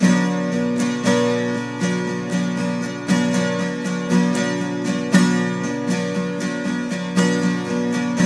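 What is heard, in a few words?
12 string minor C, guitars; Spectrasonics; Hans Zimmer Guitars VOL-1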